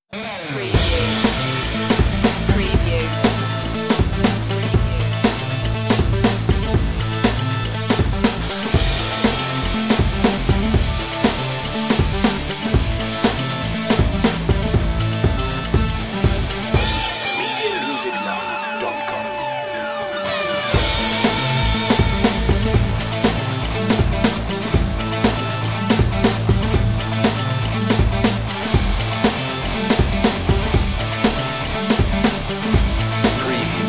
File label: beat